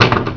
Ball To Plunger; Loony Labyrinth 1.02 Demo

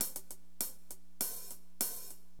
Drums, AcidJazz Funk

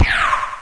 Smart Bomb Launch; Space Joust 2.0

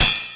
metal hit; DAoC

